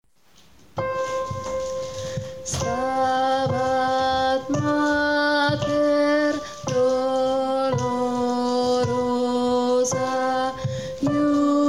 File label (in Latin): Stabat Mater tenori; Kodaly